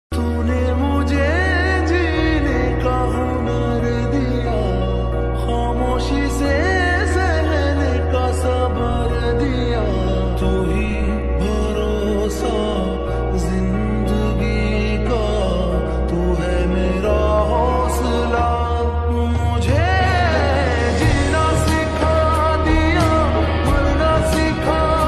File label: Sad Ringtone